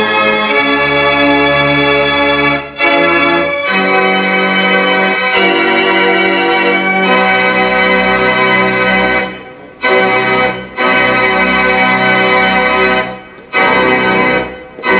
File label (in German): Orgelspiel; Kufstein